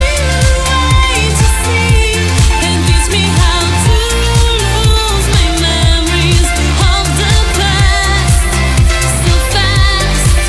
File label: jingle; hitparty